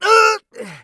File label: hit; Escape from Tarkov; scav3